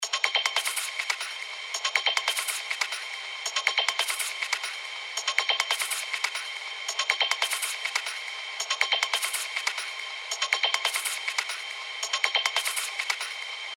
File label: Perc FX Loop (140 BPM); Divinity; revealed fx loops vol 2